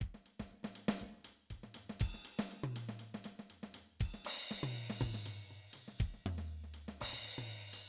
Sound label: Talk Show Background Music